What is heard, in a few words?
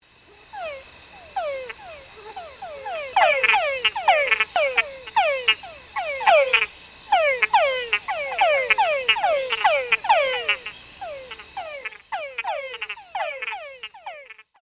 male tungara frog chorus